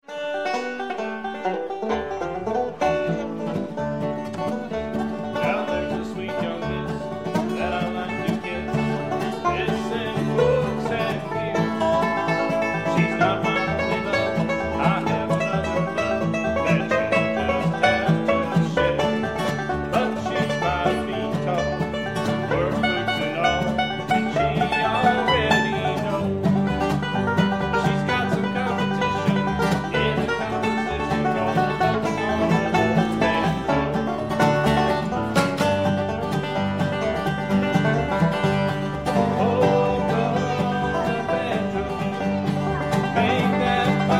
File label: Polka on the Banjo